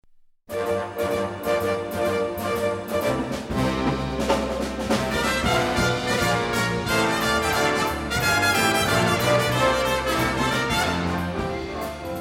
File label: Trumpets Jazz